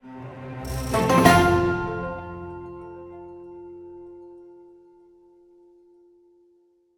stinger Chest Opened; lego horizon adventures

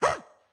Triple Swipe Attack; Caffiend Caelan

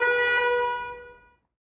Ambience Car Horn; Subway Surfers City Sound Effects; Android

